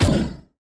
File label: heroes veggiefy out; stregi